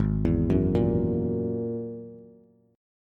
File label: Ab 7th Suspended 2nd Sharp 5th, Bass, Bass Standard; Ab7sus2#5 arpeggiated; JGuitar